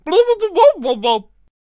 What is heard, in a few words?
Potionseller Attack Vocals